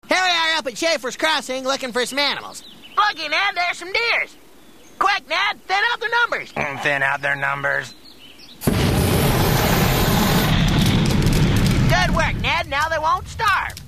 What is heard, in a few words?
flamethrower